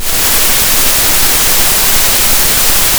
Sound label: pop, Sound of a thunderstorm